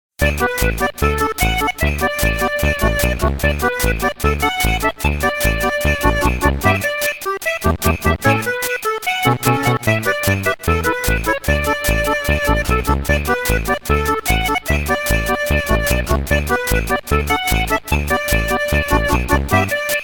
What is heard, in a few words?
Music Snow Land; MKSC